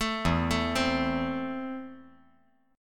E Suspended 4th Sharp 5th; 2,4,5,3

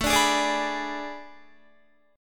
A Major 7th Suspended 2nd Suspended 4th, Guitar; AM7sus2sus4 strummed; 17,17,18,19,20,18; 4E,4A,5D,5G,5C,6F

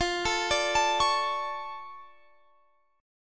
D Minor Major 7th F

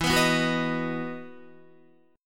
E Major 7th Suspended 2nd E, Guitar; strummed; chordshape; JGuitar

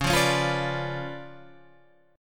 C sharp 7th Flat 9th Guitar Open G, chord

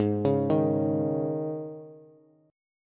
Gsharp Suspended 4th, Bass; arpeggiated; x,11,11,8

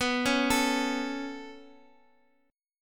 B 7th Suspended 2nd, Guitar, Open D

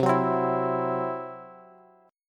C Major Flat 5th, C, down, Bass

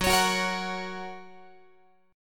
F sharp Major, Guitar; x,14,16,16,14,17; strummed

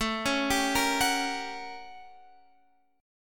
A 13th Flat 9th A, guitar chord

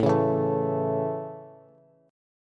A Major, Bass; strummed

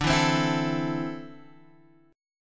D 7th Suspended 2nd down Guitar Open G

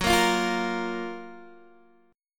F sharp Major 7th F sharp, Guitar Open D

